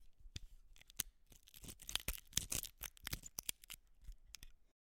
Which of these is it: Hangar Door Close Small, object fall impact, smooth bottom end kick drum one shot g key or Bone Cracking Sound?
Bone Cracking Sound